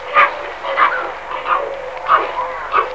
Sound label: Pelecanus crispus